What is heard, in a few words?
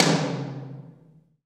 TOM TOM, Roland